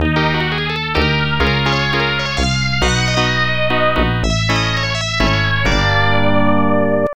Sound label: Game Over; Super Fantasy Zone; Genesis